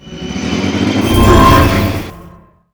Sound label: tank stealth start; nod